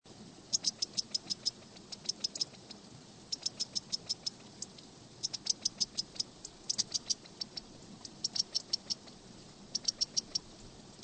codorniz

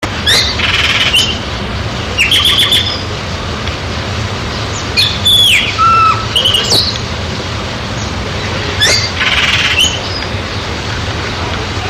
tordo

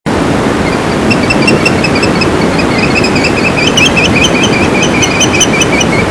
pitio

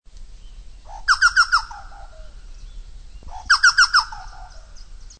chucao

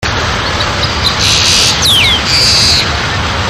loica

